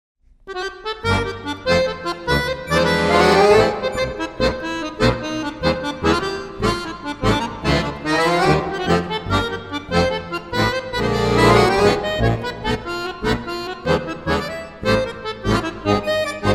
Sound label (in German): Akkordeon